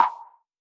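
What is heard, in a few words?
TR909 Snare Clap, Roland SH-32 Drumset; Drumset 03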